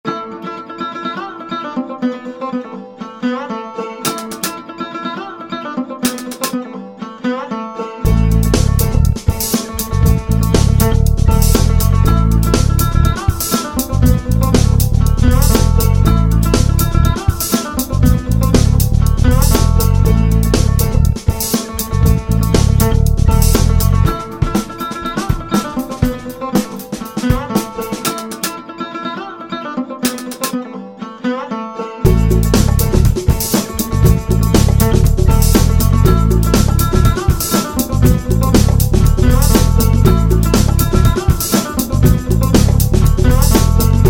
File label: Sarod Sarod